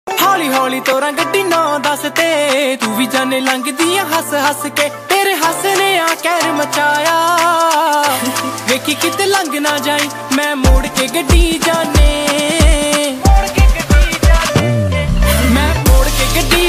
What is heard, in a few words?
Jatt Punjabi Ringtone